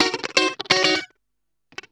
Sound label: 125 f#, guitar licks; house; Vocal House; PS-28 Vocal House Samples; instrumentloops